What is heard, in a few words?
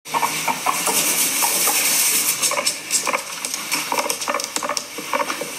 Chutting, guinea pigs; happy guinea pigs